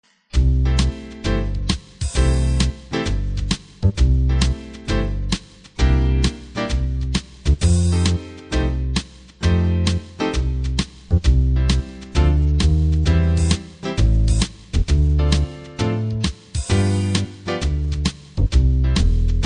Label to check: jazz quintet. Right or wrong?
Right